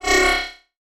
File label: Multimedia Internet CD-Rom Flash Whoosh